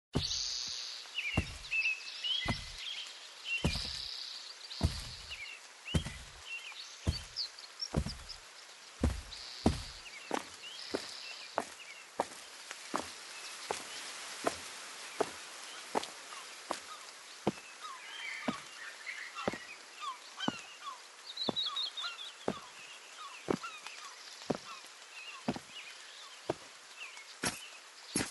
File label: footsteps